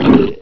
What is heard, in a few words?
gurp; ogre